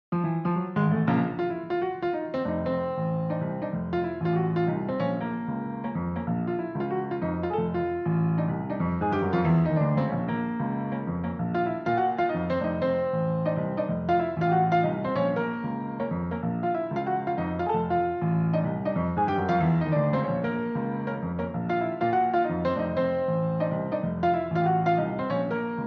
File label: Piano